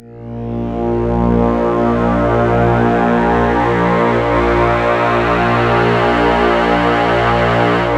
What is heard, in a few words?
A#, Synclav FM; Big Fish Audio; SPACE2